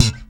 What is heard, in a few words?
POP A, Bass; Spectrasonics - Bass Legends CD 1; ALL THUMBS